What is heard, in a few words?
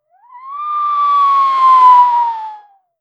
dash sound; fighting game